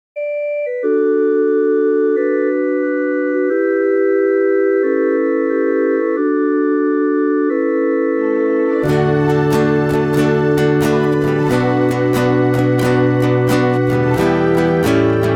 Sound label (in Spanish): Llegara la libertad Guitarra